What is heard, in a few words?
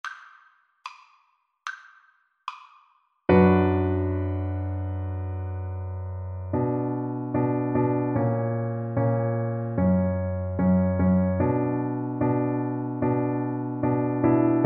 Double Bass